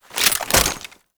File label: Belt Fed Rifle Reload